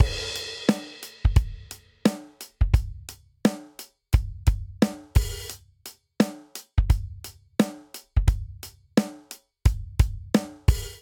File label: Rolling Hip Hop Verse 87 bpm, drum; 4 4; MIDI Clips; Ableton; Factory Packs; Drum Booth